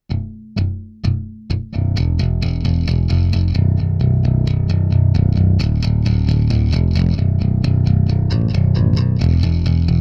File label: audix d6 bass